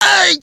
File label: hit; metrocraft; customnpcs